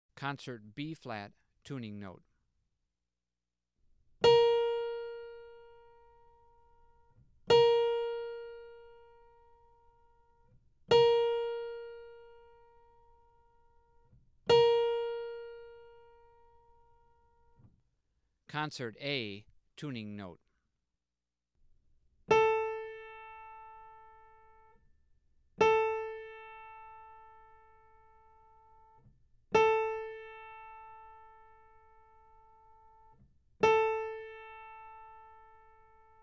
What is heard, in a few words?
Tuning Notes; „Jamey“ Aebersold Play-Alongs